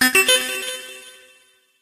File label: sparkle, notifications; Sony; Xperia Z3